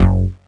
bass choc F#, Bass Shots, Chicago House; Classic Chicago House

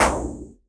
Elektron Monomachine, Drum Machines

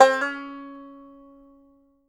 BANJO NOTES, DB4; Sampleheads; Whole Lotta Country 1 & 2

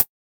Chh, Drum Hits

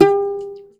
SHUR NOTE, TOPSHUR NT; Soundscan vol.60; Asian Traditions; AKAI

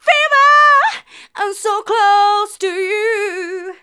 Female vocals and robo-vox

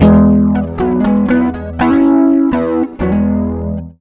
rock, acoustic guitar loops

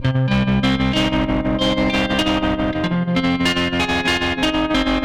Guitars A, 95bpm, analogue circuit; musicradar

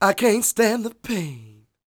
SING, Vocal Planet Blues Soul BLUESY

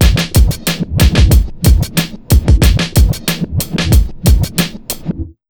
92 BEAT, Pure Electro, BEAT MIX

